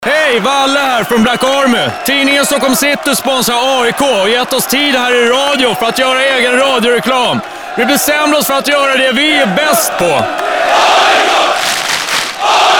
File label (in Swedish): egna klacken; AIK